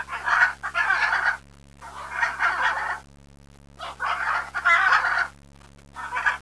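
parrot, Amazona Vittata Vittata - Puerto Rican Parrots; Endangered Species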